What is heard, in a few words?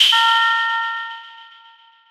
unlock; prism